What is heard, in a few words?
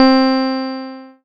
KEY OBXA C4, Oberheim OB-Xa Keys, Synths